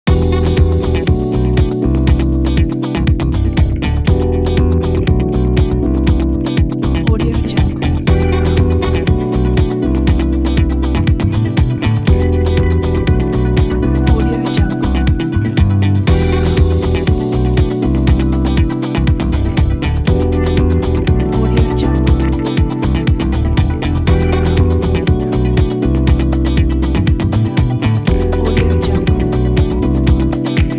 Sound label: ambient music is